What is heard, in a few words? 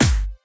Big Kick, Drums; BB 2HTC Samples Vol 4